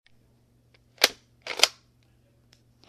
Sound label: Gewehr 1898 rifle